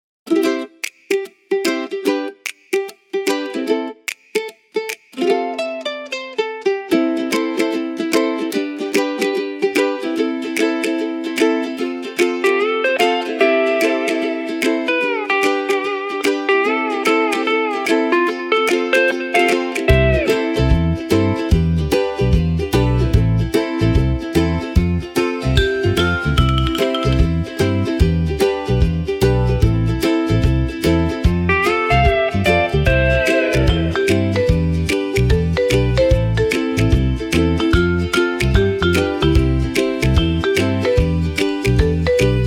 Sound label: ukulele tropical for bright and light